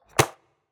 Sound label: arrow impact medium